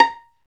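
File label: Violin pizzicato, Solo Violin FX; STR; Roland L-CD702; Solo Vln FX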